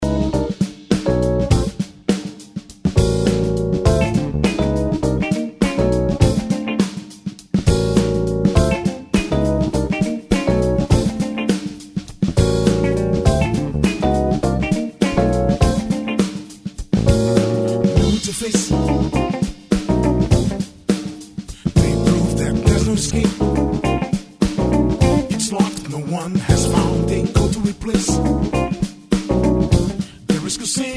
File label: Saxophone Version; Fortnite